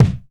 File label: BD Acoustic Air